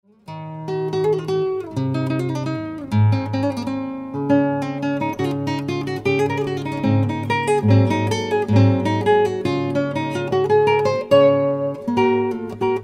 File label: MINUET